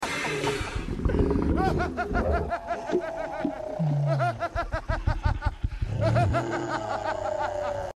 Laughing; House of Dragon Aegon

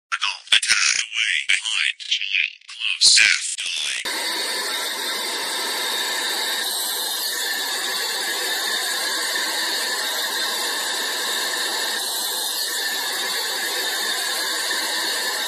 Spirit Box; Phasmophobia; Sound Effects HD

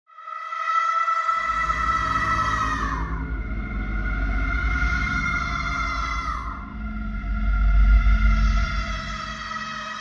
Scary Ghost Woman Screaming Horror Sound

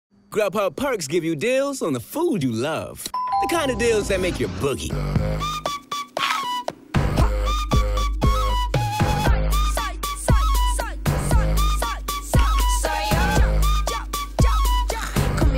music; Grub hub

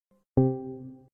AirPods Noise Cancelling Disable

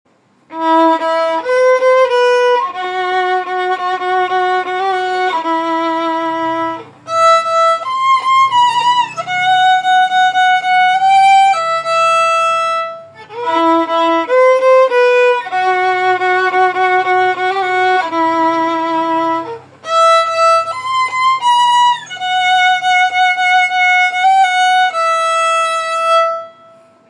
TIKI TIKI Phonk on Violin; Meme Sound Effect

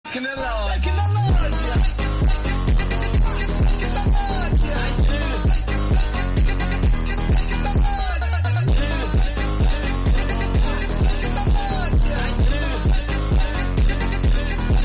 Technologia Funk

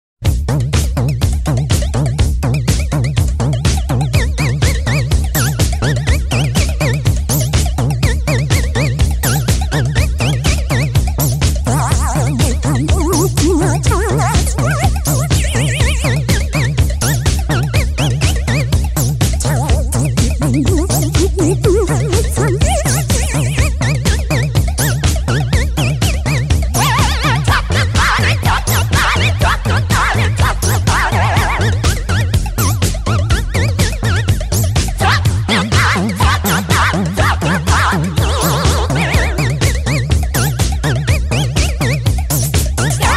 but vibrato; Shrek 2

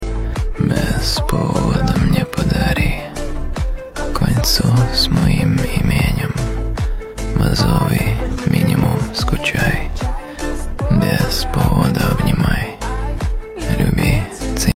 whispering song

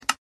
menu click; minecraft

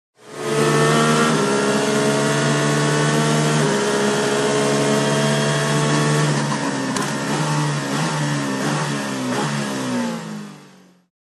racing car; sound effect hd; made with Voicemod

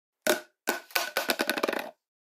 CARDBOARD PIPE FALLING

